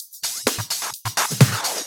Minimal Fills; Minimal Loops

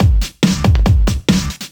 Breakbeat; Vengeance Samples; VEC2 Loops

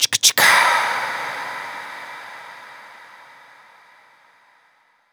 Reverb Athmospheres, FX Vocals; Vengeance Samples

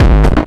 drums, combi drums; PS-28 Vocal House Samples

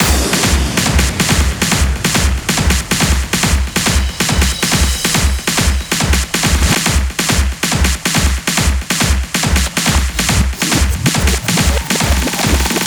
140BPM 8Bars, HandsUp & Trance Fills; SpeedYo; Vengeance Samples

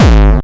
Bassdrums Hard Kicks; Vengeance Samples

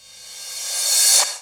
Cymbals Reverse Crash; Vengeance Samples

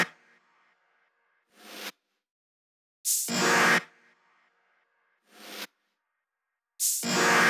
128BPM Kit; Praetor Kit

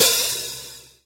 Open Hihats, Cymbals; Vengeance Samples

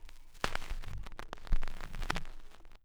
Record Noise, Vinyl Record; Record Noises

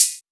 Open Hihat; Vengeance Samples; VEE Cymbals

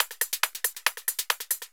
Loop 139BPM, Drums & Perc; G#minor

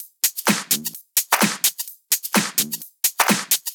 Drumloops 128BPM

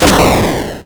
Arcade Riffs

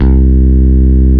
Basses C; Vengeance Samples

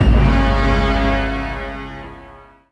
Synths C; Vengeance Samples

